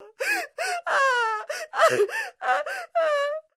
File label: crying, Human Female; Voice